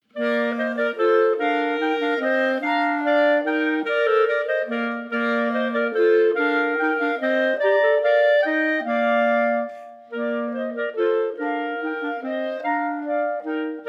Minuet, Chamber Music for 3 Clarinets; Minuet II; Partita in F; Chamber Music for 3 Clarinets Vol I

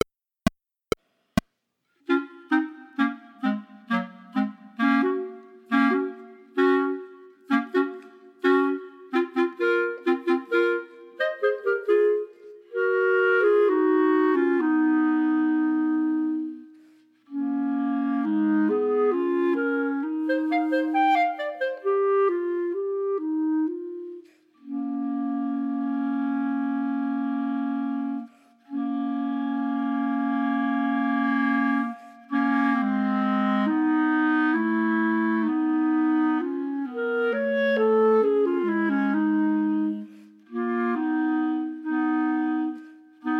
3 Pieces for 3 Bb Clarinets minus 1st part

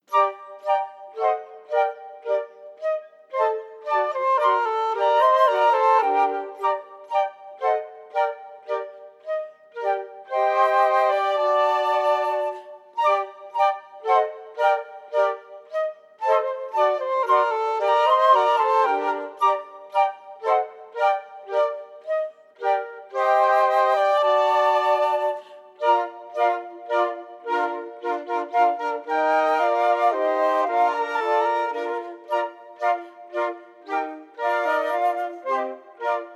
Minuet complete version; Scarlatti

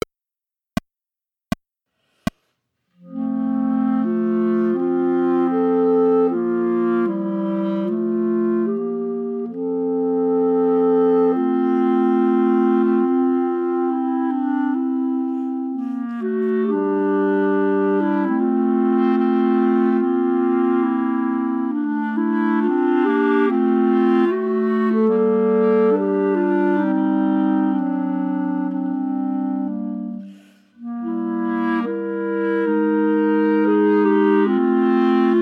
Prayer Ensemble minus 1st part; Humperdinck

